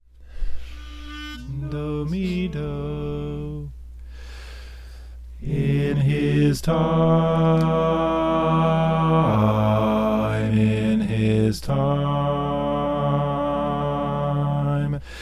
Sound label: bass